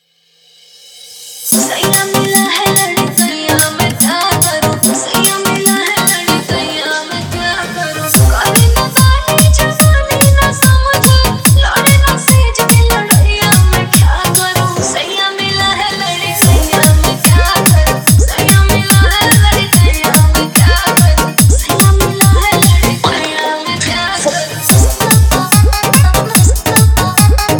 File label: Bhojpuri Remix Song